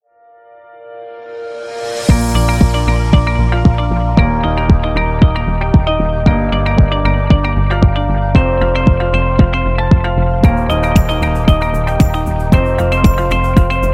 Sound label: Smooth